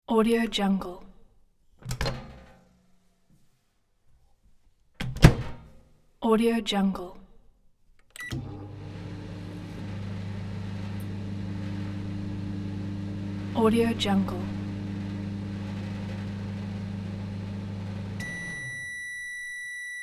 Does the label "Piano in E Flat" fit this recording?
No